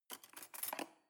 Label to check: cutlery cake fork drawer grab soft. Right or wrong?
Right